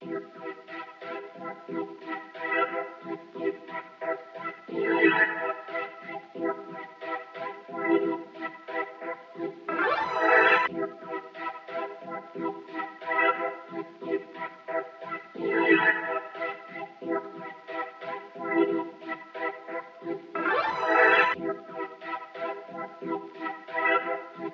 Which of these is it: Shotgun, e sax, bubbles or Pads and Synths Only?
Pads and Synths Only